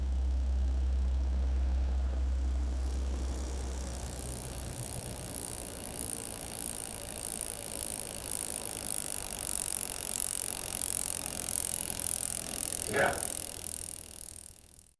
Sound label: old bicycle riding